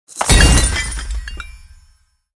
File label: Piggybank Open; candy crush saga gamerip